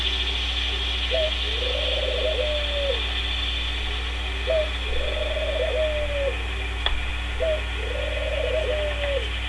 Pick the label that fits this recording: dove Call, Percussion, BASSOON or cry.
dove Call